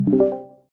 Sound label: undeafen; DiscordClone